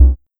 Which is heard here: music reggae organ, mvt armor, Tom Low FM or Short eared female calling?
Tom Low FM